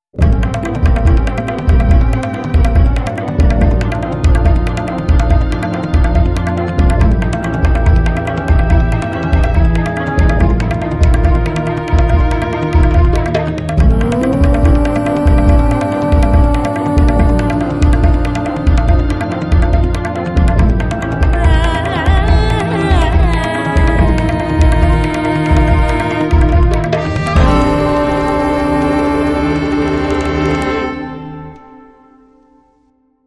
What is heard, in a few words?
Tango, End Groove